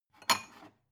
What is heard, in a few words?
glass take soft